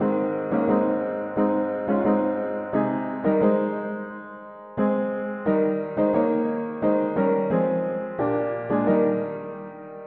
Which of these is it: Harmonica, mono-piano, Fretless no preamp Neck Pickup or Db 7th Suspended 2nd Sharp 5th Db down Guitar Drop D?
mono-piano